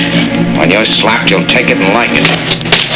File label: slapped